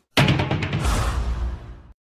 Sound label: Drums; Black Panther